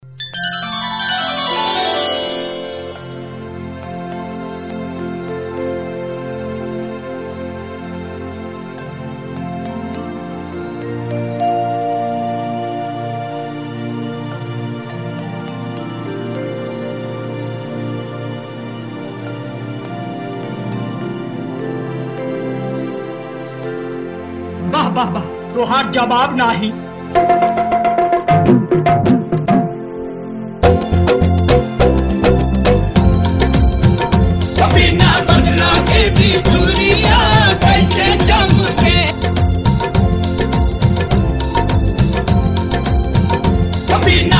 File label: Karaoke